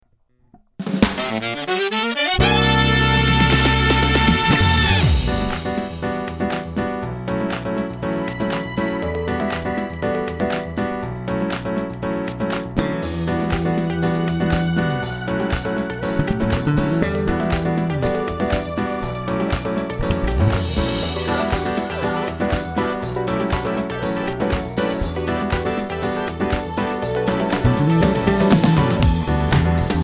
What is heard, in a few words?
Sax; サックス